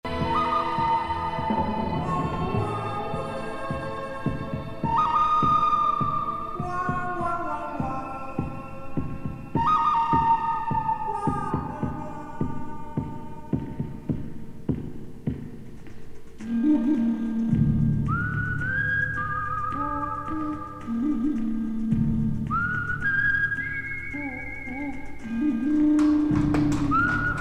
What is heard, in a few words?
western